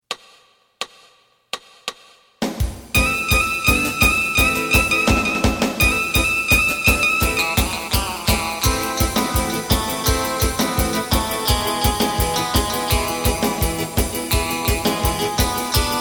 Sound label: bass